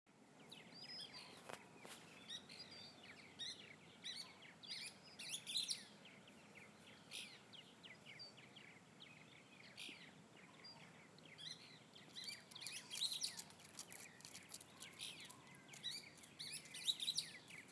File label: kingbird